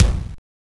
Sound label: Kick, Genesis